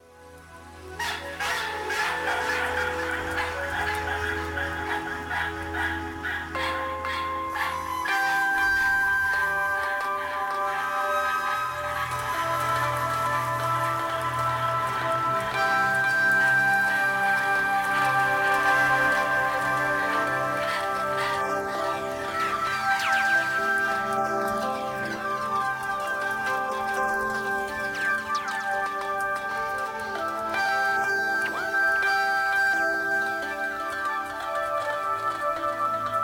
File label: Dawn To Bells Handpan In My Soul; Noisesurfer